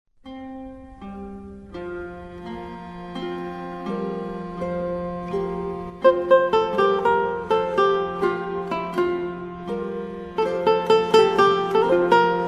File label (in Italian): salterio